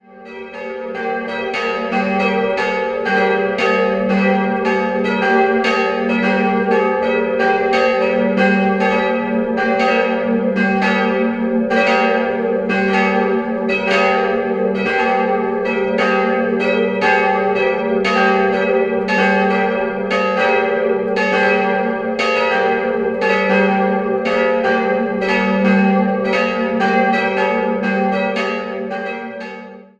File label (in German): Großinzemoos, Glocken